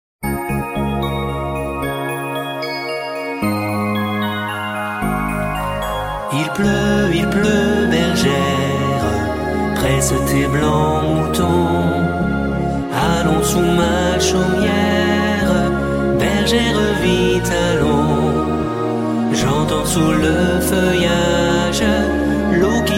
chanson

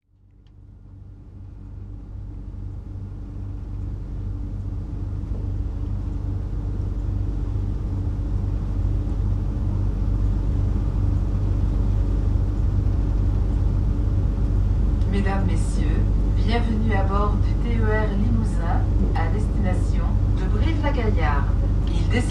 train ussel brive

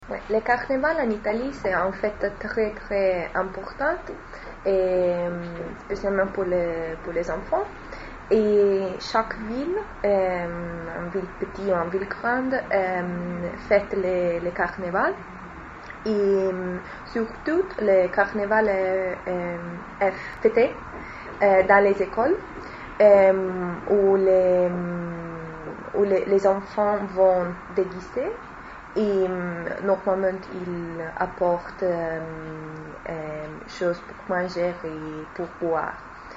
carnaval ivrea

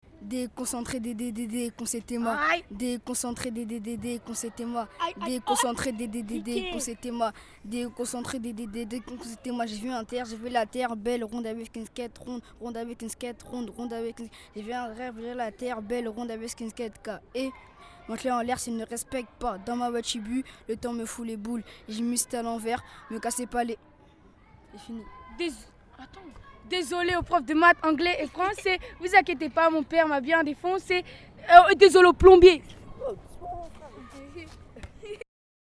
rap jeunes

